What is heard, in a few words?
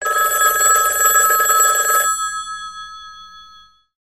Telephone